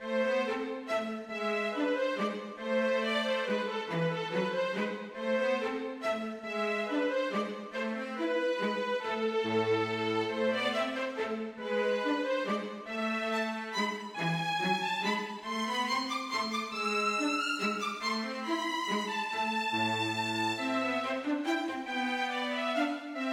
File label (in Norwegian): Menuet for fiolin og cello; Aamodt